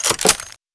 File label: cover up, weapons; pkm; paranoia